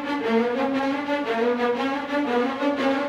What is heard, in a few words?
GRO, Orchestral Loops; 128 HITCHCOCK